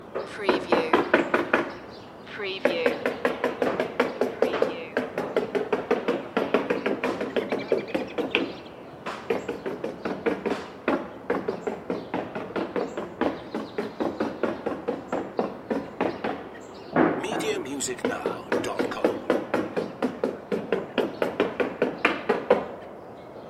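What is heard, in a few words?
Contractors Hammer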